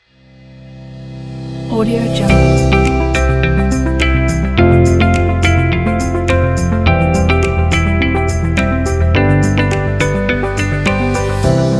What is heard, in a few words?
happy uplifting logo